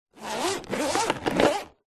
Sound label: industrial power tool zip case zipper